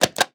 bet Slip Select Spins; netent

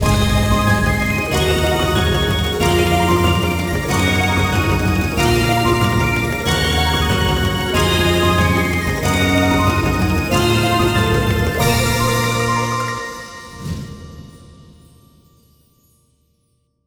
mega win

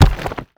symbol Landed

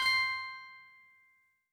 Coin Count End; netent

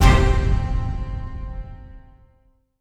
small Win

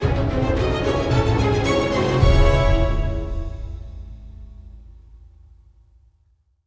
outro Bonus Game; netent

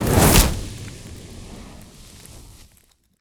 scatter hit

